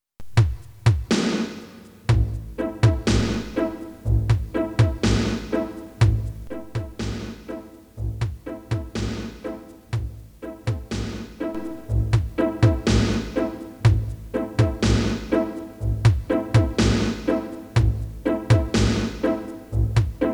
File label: Classical Arpeggio violins; tape